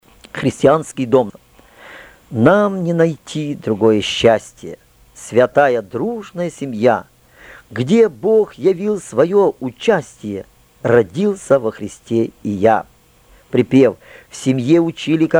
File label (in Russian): Христанский дом (псалом)